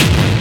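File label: hard bass drums; Bazzdrumz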